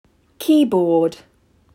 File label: Keyboard